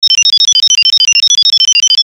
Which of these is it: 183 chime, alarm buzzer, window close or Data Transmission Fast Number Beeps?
Data Transmission Fast Number Beeps